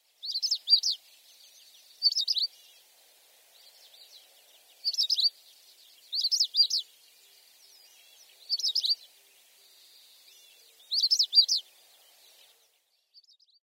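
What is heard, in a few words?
Long-tailed Rosefinch; benimashiko; monbetsu; koe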